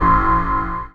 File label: Dance Mega Synth; Best Service; 90 s Sample CDs